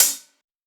Closed HH, PsyTrance; Cymbal

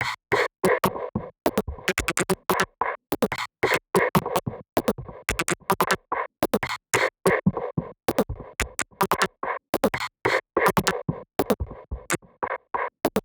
Loop, PsyTrance

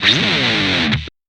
guitar FX; PsyTrance

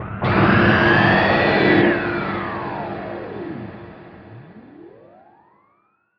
FX; LiLA'c Records PsyTrance Sounds 01

